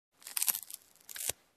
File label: Crunching